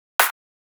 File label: Hit; PsyTrance Project; Ableton